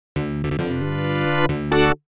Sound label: Wave Complete; Off the Wall